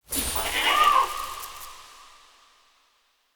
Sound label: Beaver Mote; Animal Motes